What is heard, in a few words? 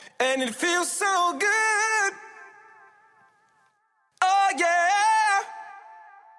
Vocals